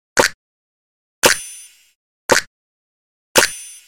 Clap; hard hitting

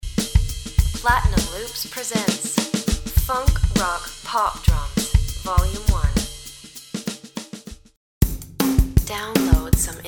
funk rock pop drums; live acoustic drum loops for Pop, Funk and Rock

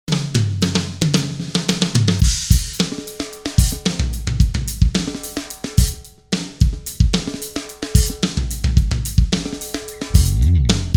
pure funk

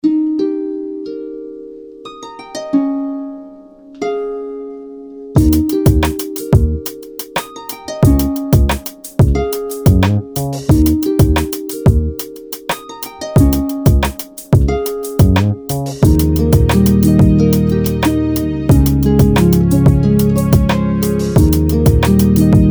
orchestral harps

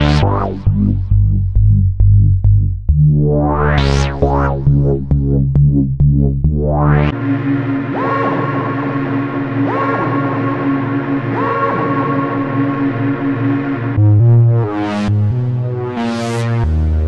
trance pack

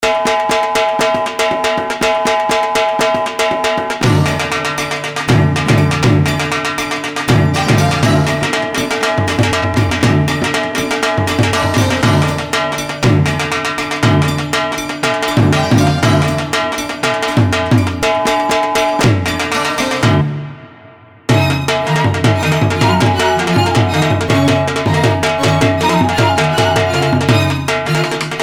dhol loops

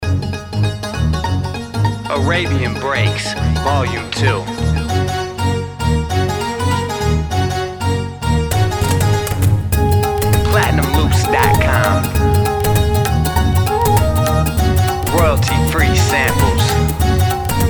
arabian breaks

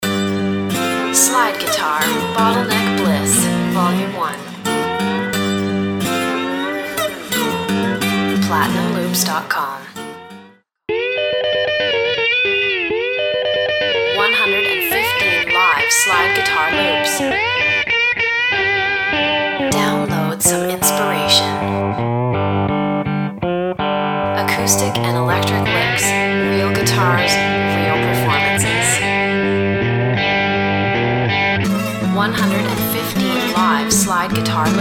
slide guitar bottleneck

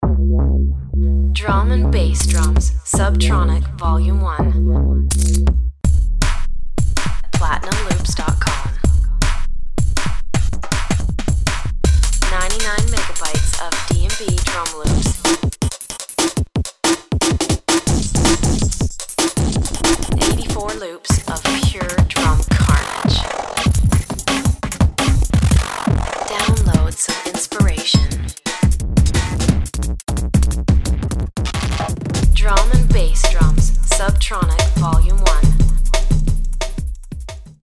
drum and bass subtronic; electronic drum loops for RnB, Jungle, House, DnB

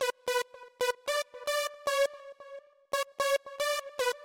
Main Synth Example

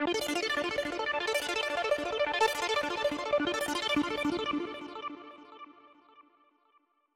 chord sequence audio

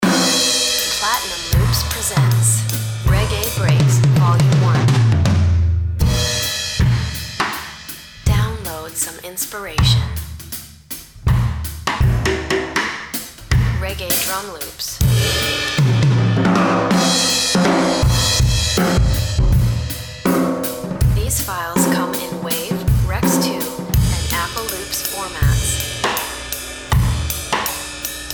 reggae breaks

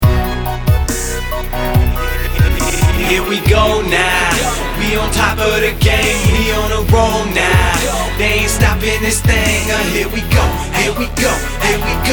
stutter

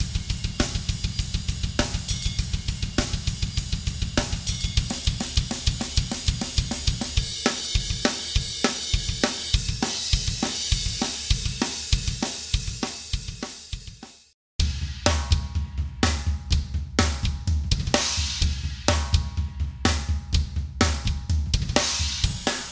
metalcore death metal drums

